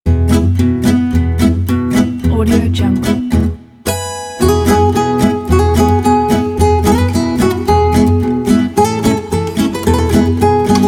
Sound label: jingle bells with acoustic guitars